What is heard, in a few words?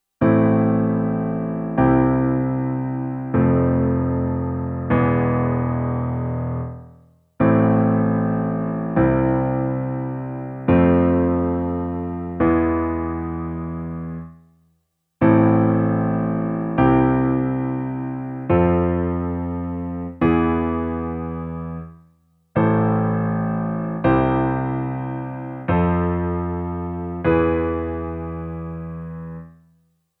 Progressions using inversions